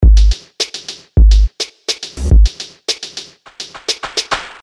dh dhh slow

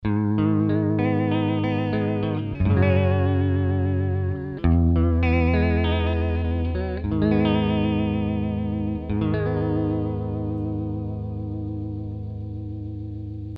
medspeed

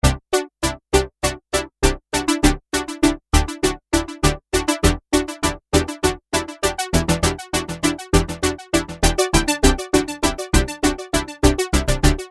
classic arpeggio

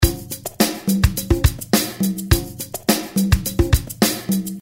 congas groove

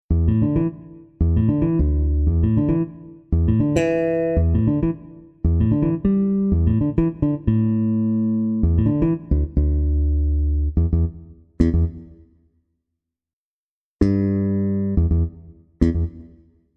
string pick comp

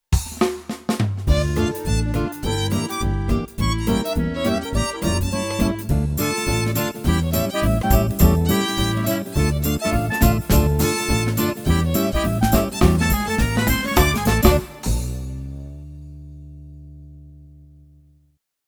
Django

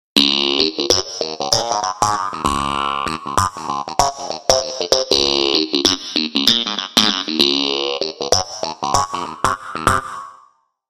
Funky Stretch

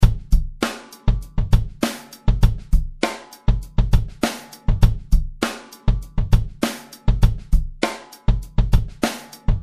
Bass

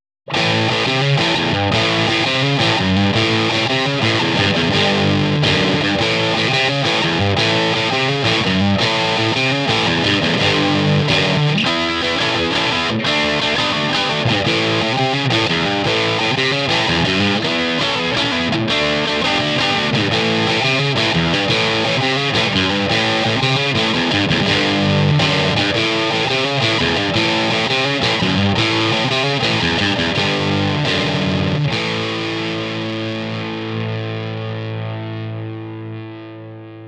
AC4TV Classic Humbucker Rock at 1 Watt

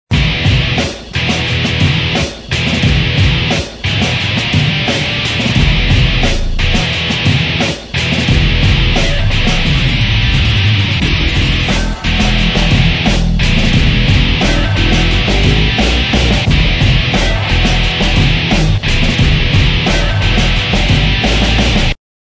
Ultra Heavy Riff

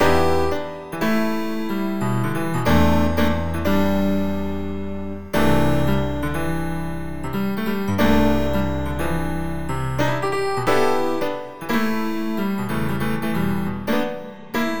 Layered ePiano

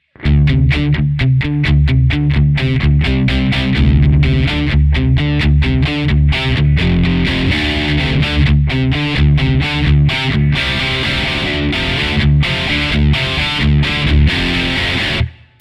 Heavy

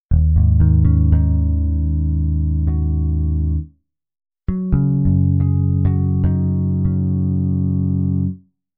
Bass Harmonix

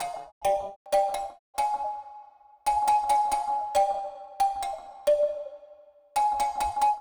step to this marimba

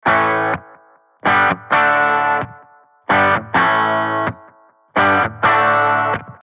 Bar Clean

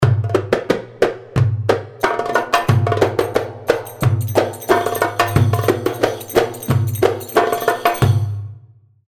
Zafa, darbuka

